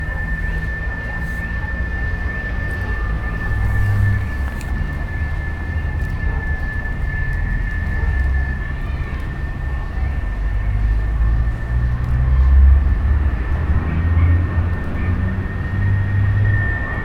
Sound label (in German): Warten; Brasilien; Fieldrecordings